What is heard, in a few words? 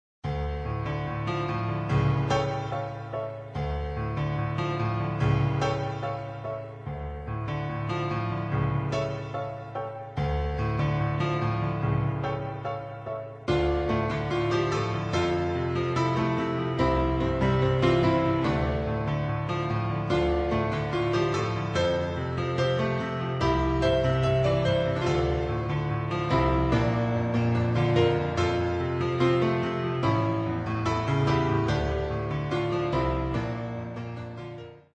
upbeat instrumental piano music